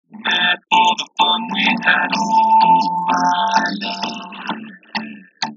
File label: Music, Auralization